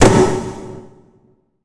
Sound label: jump pad; ML-Agents; FPS Game